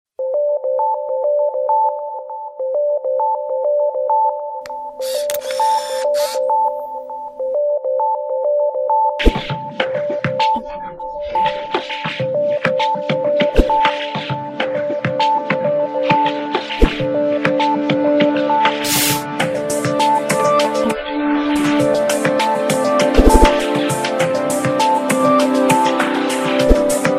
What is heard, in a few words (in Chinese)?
科技感十足, 背景音乐